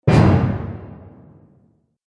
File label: Bump Cinematic Impact; Miscellaneous Impact Sound Effects